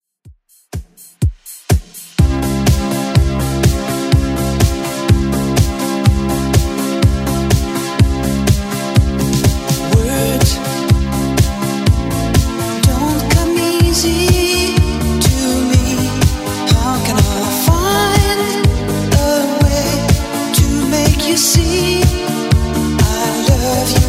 80s Redrum 124bpm

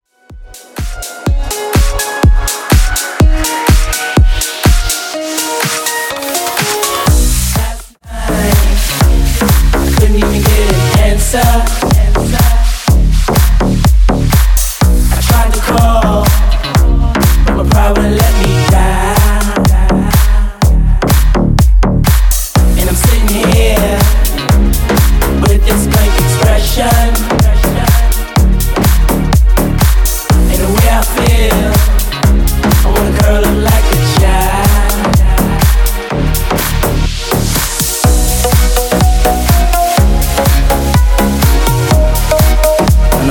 House Clubing 124 BPM

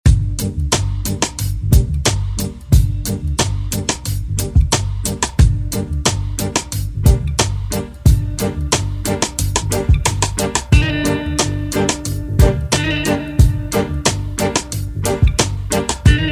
Reggae Redrum

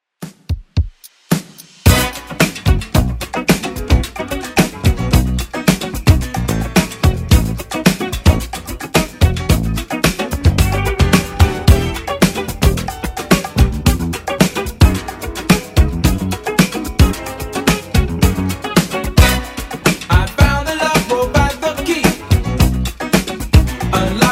70s Redrum 110bpm

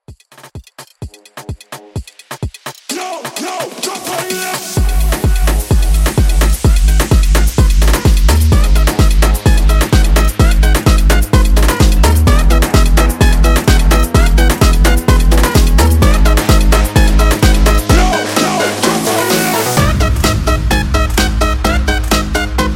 Hybrid 128 CLEAN

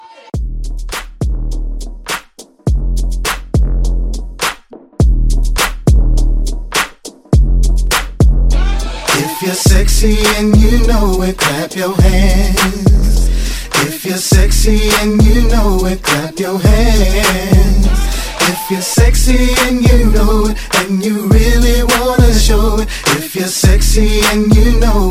Hype Edit 103; remixplanet

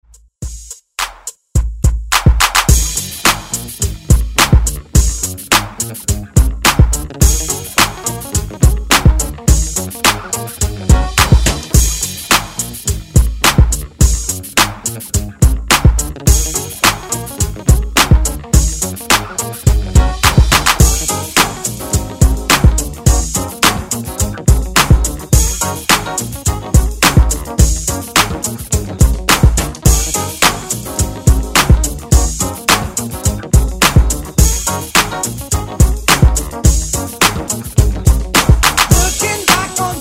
70s

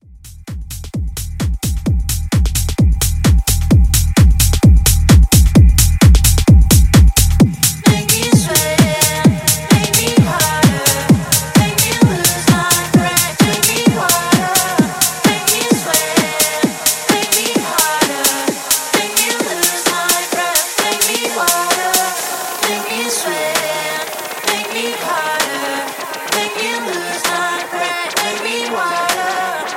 House Mix

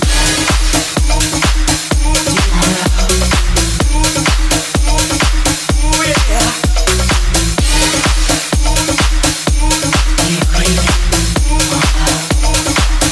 Snippet 127bpm Ebm

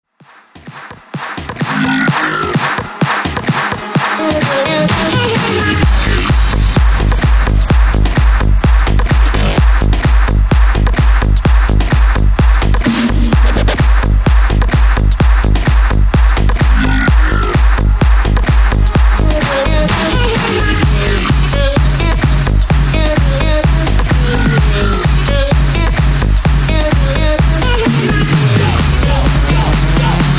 Bounce Banger

